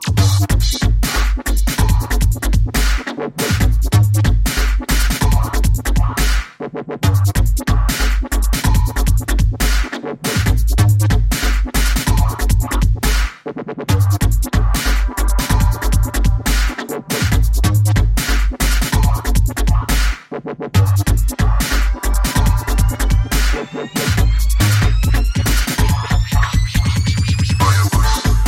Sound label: DUBSTEP